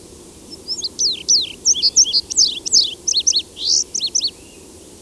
Indigo Bunting; ibm glen

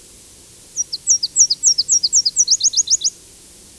Nashville Warbler; ibm glen

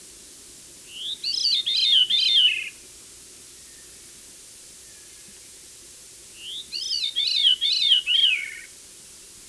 Veery; ibm glen

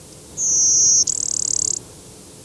Blue-winged Warbler; ibm glen

